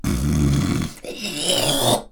hocking

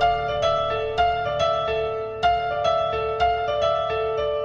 piano, Sad; loops

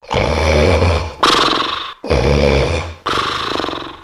snoring

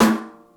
snare, Real

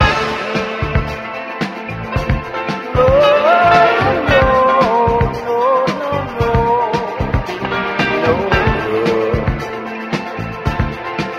full voice oh no, Loops Phonky

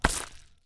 splat

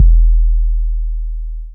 kicks heartbeats

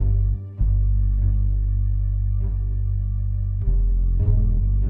strings violin, Sad Loops

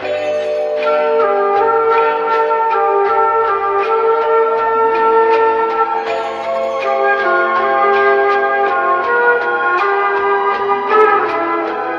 flute, Loops

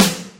snare, Real

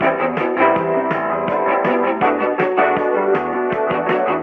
strings, Loops; Phonky

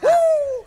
woo

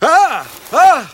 huh ha; ooh ahh

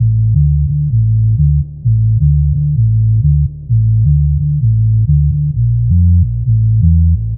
bass, Loops Phonky

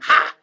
"ha", Voice

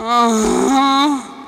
female orgasm; ooh ahh

